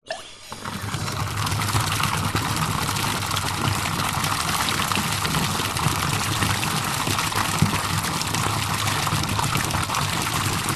household bathtub tap run into water bubbles; zapsplat

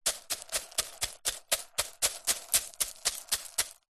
nature ice crushed pieces pile taps pats fast

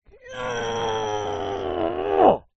human male scream strain lift; zapsplat

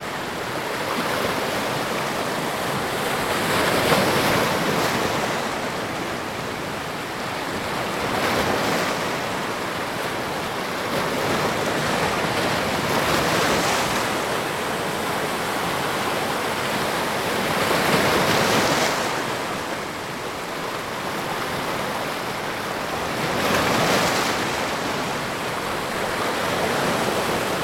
water ocean waves on rocks

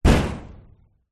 impact metal door fist rattle; zapsplat

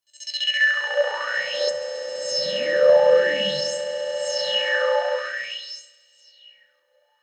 sound design pulsating stutter high pitched synth

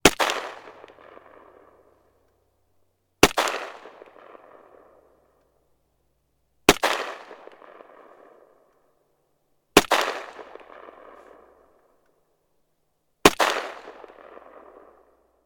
weapon gun sks; still north media

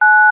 household telephone dtmf tone 7 short; zapsplat

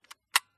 Smena Symbol camera wind lever locked; mkh8060; PMSFX

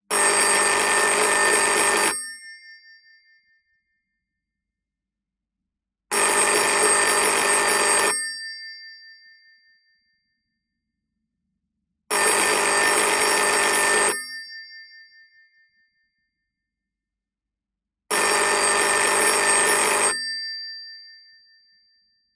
Siemens Masterset 111 USA standard ringing; 1980; Sound Ex Machina

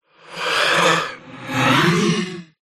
horror voice ghostly vocalisation

